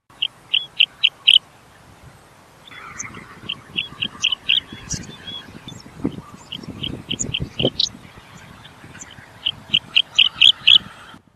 Striated Grassbird calls, Marina Miri Sarawak Malaysia